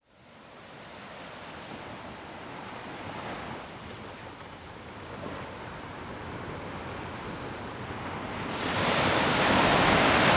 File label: Relaxation Music of Fantasia and Ocean